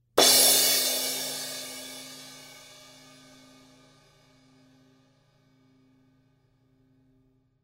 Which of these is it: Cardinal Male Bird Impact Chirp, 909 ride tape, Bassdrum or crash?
crash